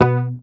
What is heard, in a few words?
Bass, MUTES; Sampleheads; Will Lee Bass Library; HAMER12